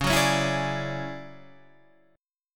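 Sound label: D Major Sharp 11th D flat, chord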